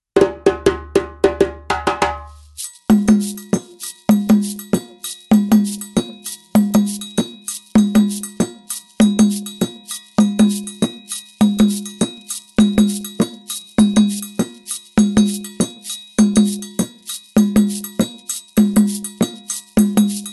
kenkeni; denadon; rhythms

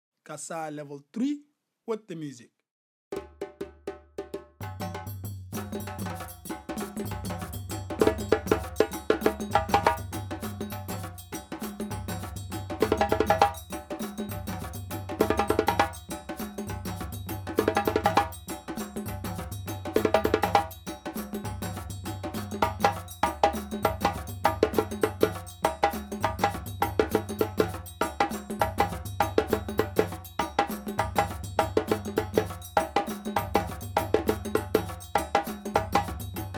kassa solo; level 3

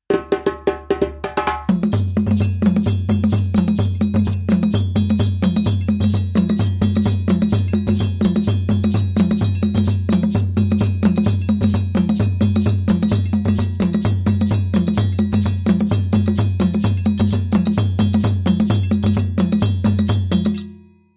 makru traditional dununs; rhythms

